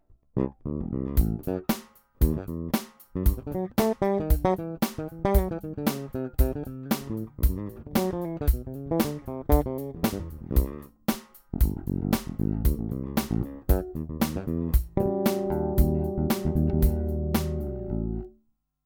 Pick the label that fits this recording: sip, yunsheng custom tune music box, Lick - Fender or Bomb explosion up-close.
Lick - Fender